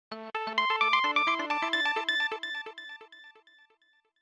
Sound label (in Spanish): Efecto de sonido para entrada de logo